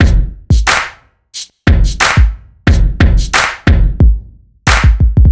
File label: Shake N Clap